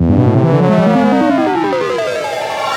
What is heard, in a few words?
Em ish 74 Melodic Turn